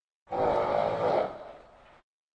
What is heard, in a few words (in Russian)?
hrap loshad v pomecheniii